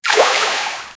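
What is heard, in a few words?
water splash, lake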